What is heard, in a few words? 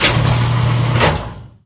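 sliding door; dn3d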